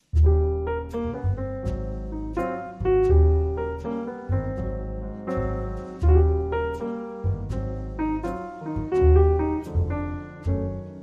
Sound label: With Trio